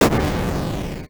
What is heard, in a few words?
explosion